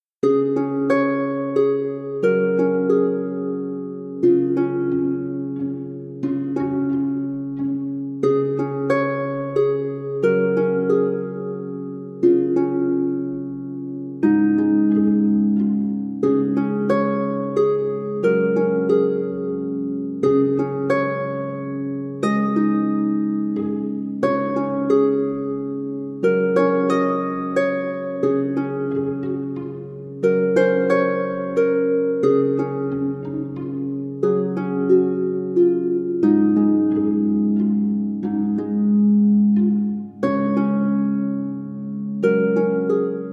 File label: Spring Harp